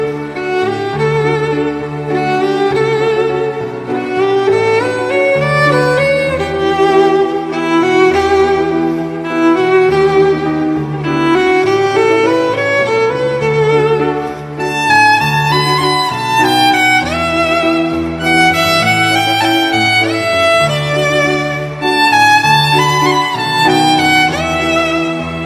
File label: Violon